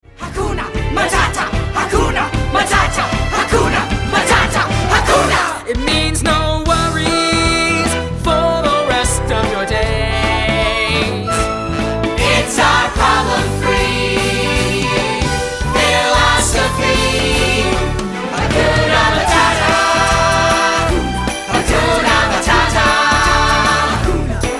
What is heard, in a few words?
Older Vocals